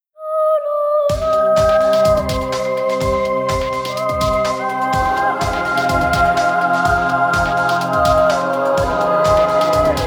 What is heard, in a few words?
Cathedral Remix